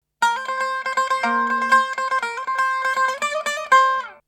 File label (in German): buzuk